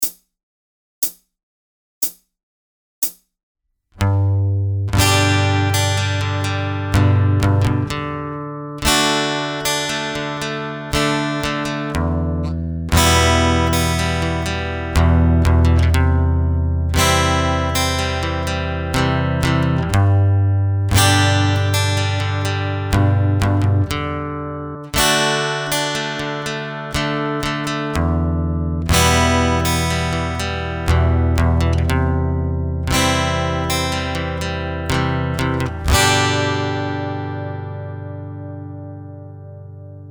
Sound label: Pick Strumming the Modern Strumming Pattern SLOW, guitar; Ultimate guide to strumming book